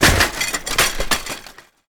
bike fall; Golden Crayon Sounds

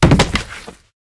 wood fall; Golden Crayon Sounds

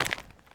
ash step; Golden Crayon Sounds

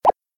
wrap; ChromeVox Classic Sounds

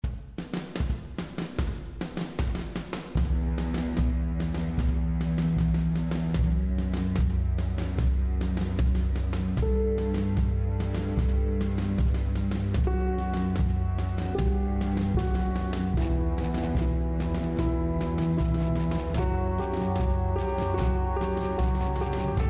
Menu Music; JFW is a Murderer Music

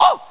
Bubble Disappear; ESAN VO Sound Schemes; ESAN MK Sound Packs

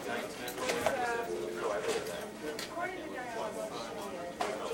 restaurant; Original TK Sounds

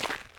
dirt step; Golden Crayon Sounds

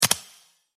Old iPhone Lock Sound

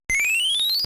Web Page Loaded Alternate; Salem's Sound Schemes; mario

